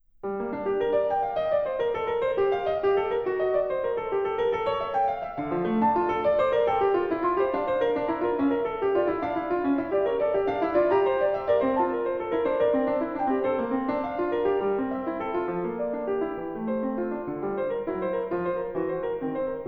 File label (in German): Fuge B moll opus 89 nr 2